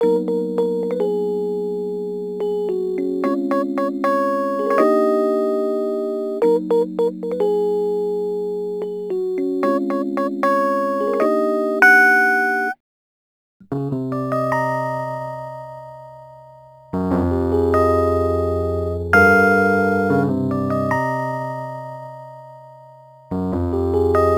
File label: Rhodes Attacks; Ueberschall